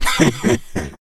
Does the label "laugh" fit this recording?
Yes